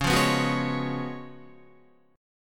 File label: Db 11th Db, Guitar; strummed; JGuitar